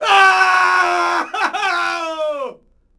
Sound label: man fall by; Sid Meiers Pirates; XBOX